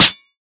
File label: flavor metal, bullet impacts; physics; Half Life Model Pack